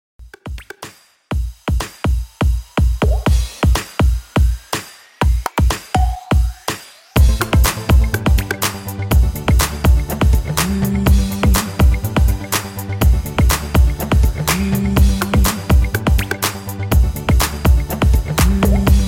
123Bpm; Redrum

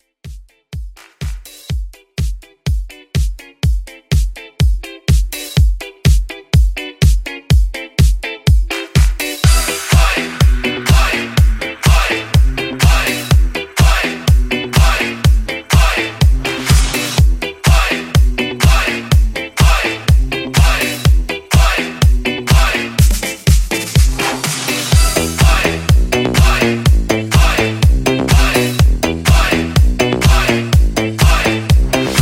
Dance Rework 124

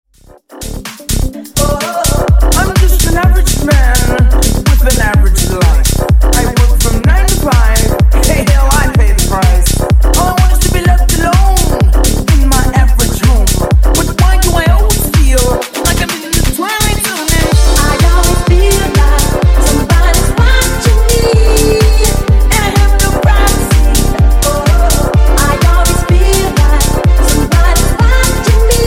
Disko Funk 126Bpm

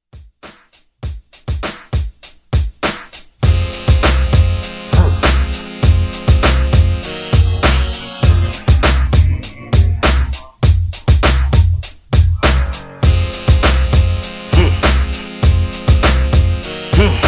Redrum

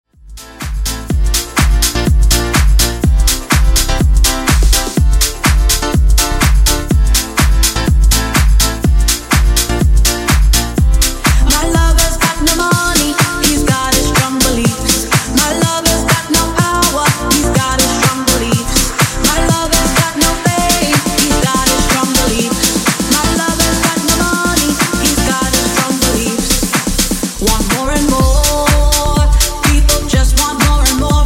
Disko Funk Remix 124Bpm

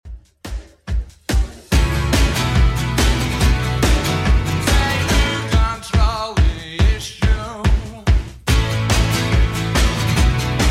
80s Redrum 142bpm

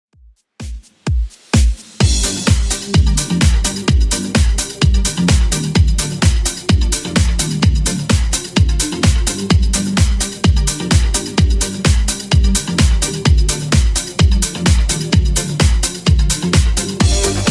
80s redrum 128 bpm clean

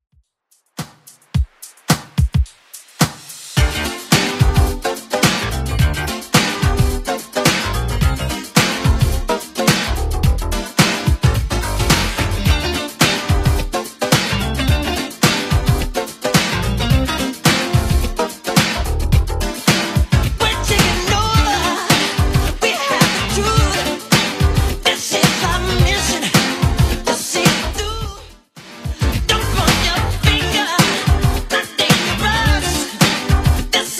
80s Redrum 108bpm

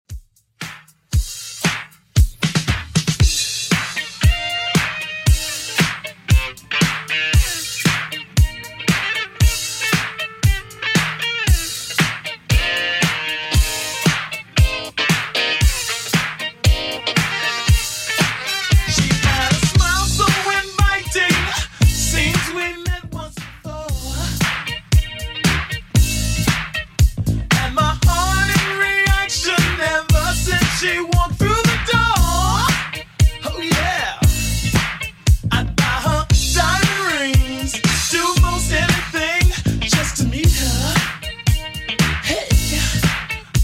80s Redrum 116bpm

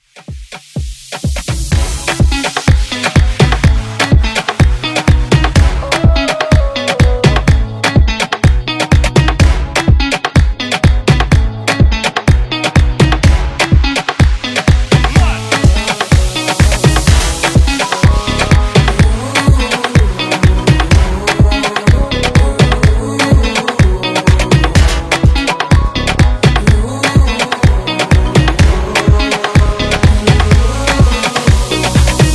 Afrobeat Redrum

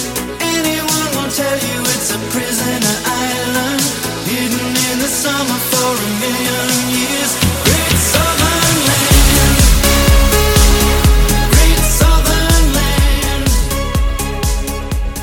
124 bpm, Dbm

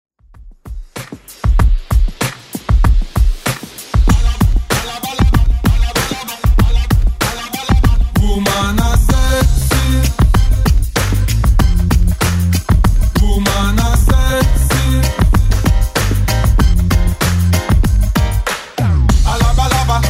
90s Redrum 96bpm

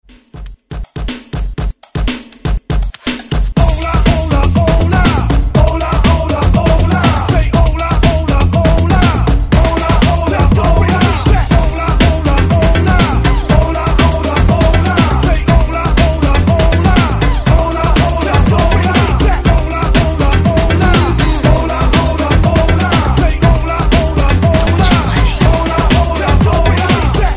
90s Dance Hype 121 Bpm; DJDOLLS

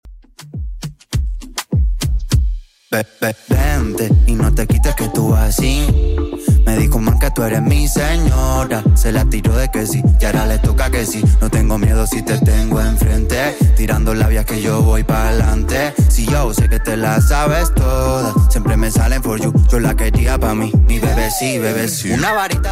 Top40 Redrum 101bpm

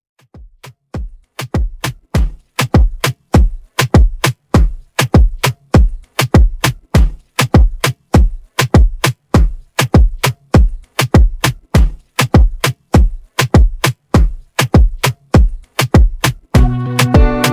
AfroBeat Redrum

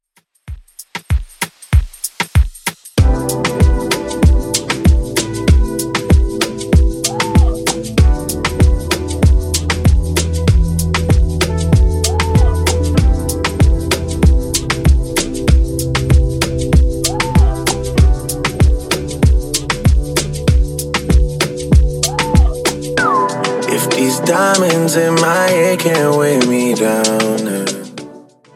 Top40 Redrum 96 bpm

